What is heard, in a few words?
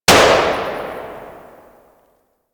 Sicarus Tail 2D, weapons; DE; Warframe